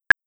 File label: canvas Click; ChessHelper